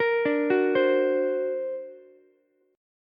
D Augmented 7th Ukulele, chord; D+7 arpeggiated; JGuitar

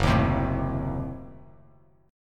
Gb Diminished 7th, down, Bass Standard; strummed; JGuitar

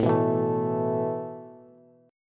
A Suspended 2nd A Bass; Asus2 strummed

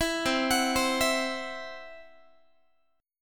C Major Flat 5th Guitar; CMb5 arpeggiated

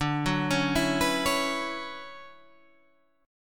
D Major 13th D down Guitar Half Step Down

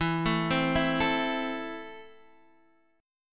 E Suspended 4th Sharp 5th, Esus4#5 chord, guitar; arpeggiated; JGuitar

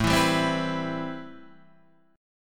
C Major 7th, A, guitar chord; Cmaj7/A strummed; JGuitar

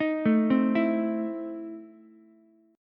E Major 7th Suspended 4th Sharp 5th Ukulele; EM7sus4#5 arpeggiated

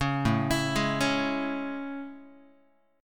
A Major 7th A, Guitar; AM7 arpeggiated; JGuitar

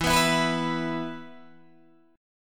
E 5th, Guitar, DADGAD

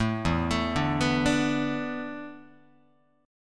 E 7th Suspended 4th, Guitar; E7sus4; arpeggiated; 0,0,0,0,3,1

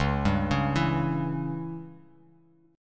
C sharp Diminished C sharp down

